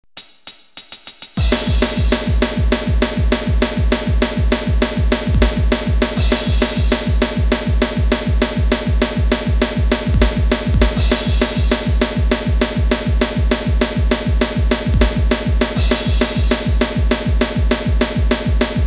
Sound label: thrash beat